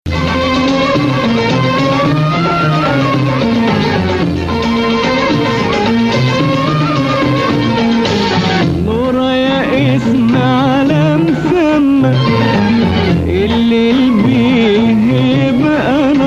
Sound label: Nahawand